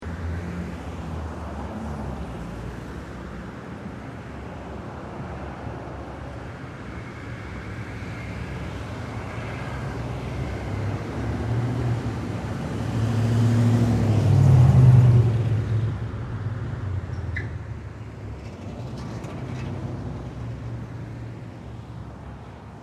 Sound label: Urban City Street Close Up Two-Way Traffic; Moderate to Easy traffic moving in both direction on cit; Traffic And Car Sound Effects